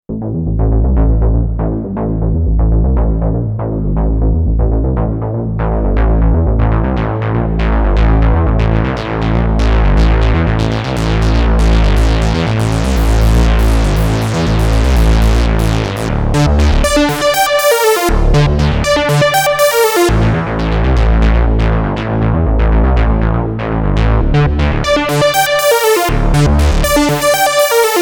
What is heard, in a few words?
Sequential Pro 3; Goom